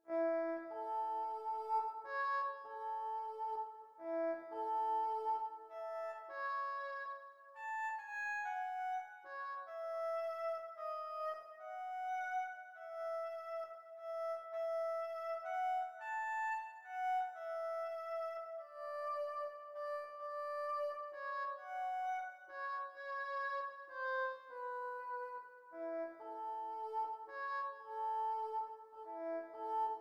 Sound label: Bassoon